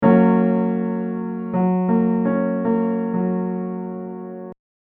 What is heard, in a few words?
Major triad